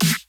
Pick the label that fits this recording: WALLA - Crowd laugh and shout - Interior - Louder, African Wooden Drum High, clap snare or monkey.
clap snare